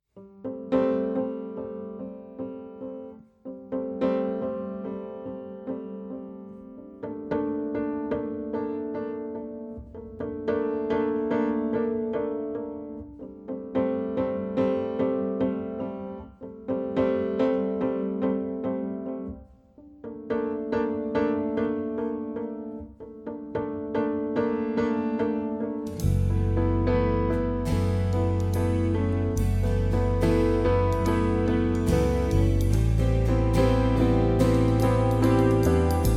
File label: Loud Jazz Band